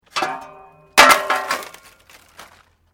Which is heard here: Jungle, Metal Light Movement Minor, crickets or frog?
Metal Light Movement Minor